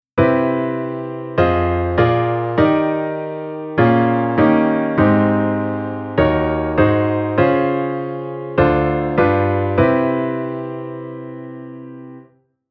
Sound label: Chord Constant